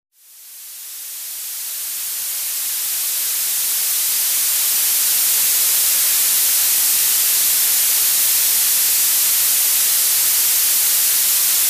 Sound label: white noise